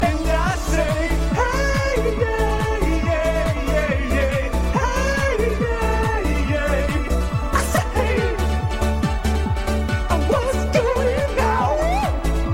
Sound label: heyyeyaaeyaaaeyaeyaa